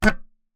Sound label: Melodica Short Note